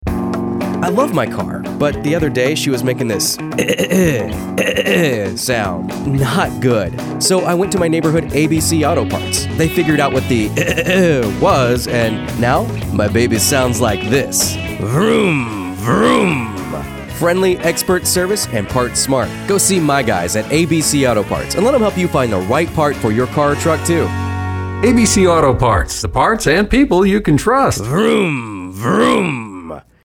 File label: I Love My Car Pop; ABC AutoParts